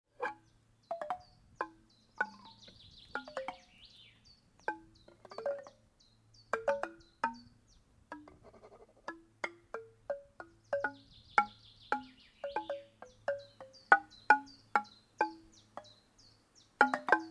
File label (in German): Marimba kurze Impro; Marimba Alouette; Pierre Corbi; Will Menter